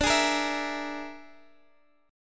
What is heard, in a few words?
E 7th Flat 5th, D